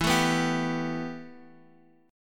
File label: A 7th Suspended 2nd, E